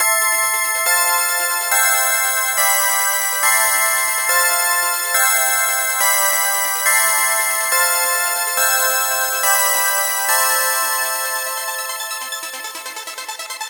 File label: 140BPM A; Vengeance - Essential Clubsounds Vol.4